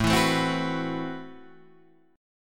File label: A Minor 6th Add 9th A, Chord; Am6add9 Chord {5 7 7 5 7 7} strummed